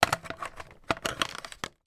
household vacuum cleaner upright attachment put on; sound effects one